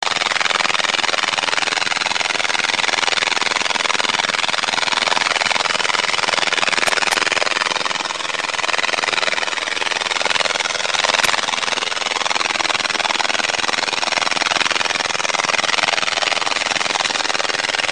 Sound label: conocephalus fuscus, heuschrecken; insekt; wirbellos; biologie